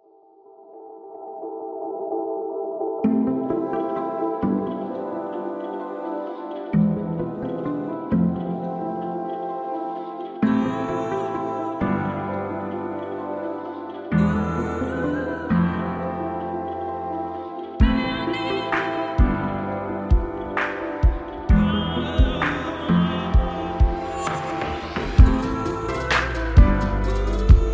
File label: Pop EDM